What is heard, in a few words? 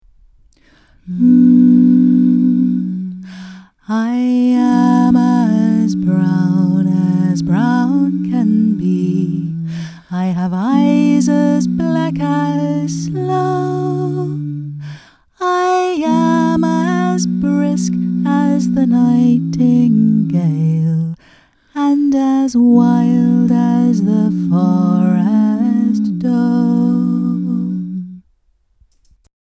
The Brown Girl FULL SONG; EFDSS